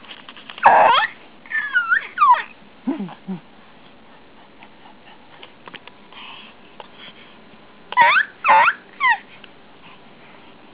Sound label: squealing